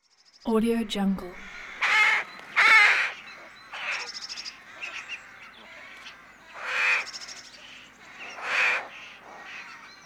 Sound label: garden ambience